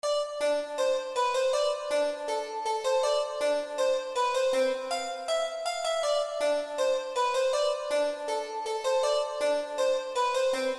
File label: harpsichord